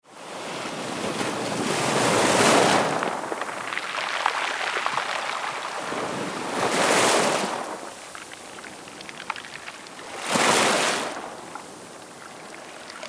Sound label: Sea waves sound